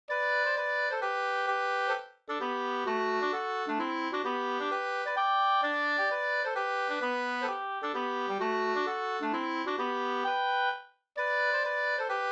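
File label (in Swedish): polska, tvåstämmig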